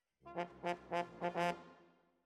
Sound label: Horns